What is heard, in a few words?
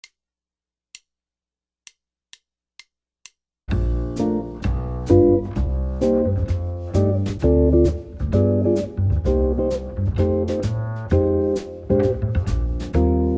rhythm changes, jazz guitar